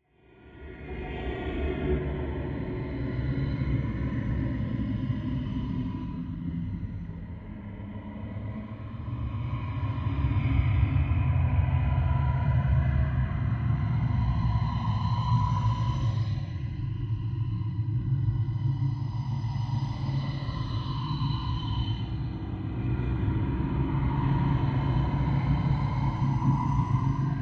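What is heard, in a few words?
Ambient Pulse Loop; Silent Hill Homecoming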